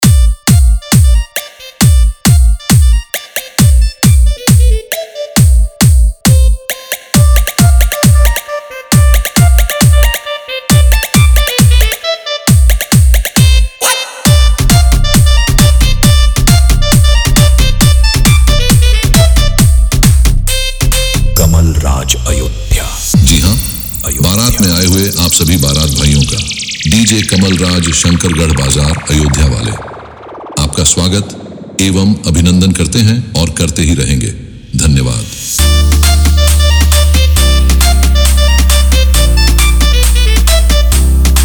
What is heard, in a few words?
Dance Remix; Competsion